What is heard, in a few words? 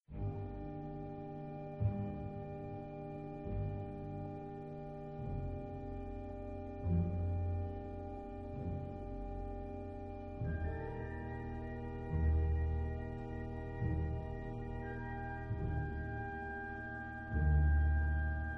Adagio